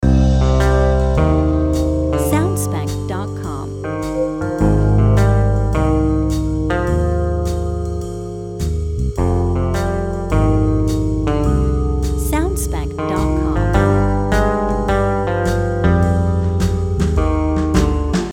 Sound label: Detective Game Short Variation